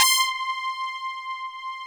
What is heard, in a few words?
TS 10, Analog, Synthesizers; Soli-Music; World of Synthesizers VOL-1